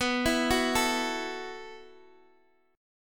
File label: B 7th Suspended 4th B down Guitar Open G